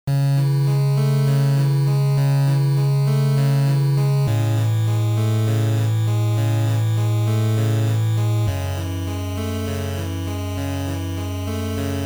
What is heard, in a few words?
8-bit; Lenna's Inception; Desolate Dungeon